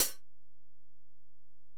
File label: HiHat, Alesis DM-PRO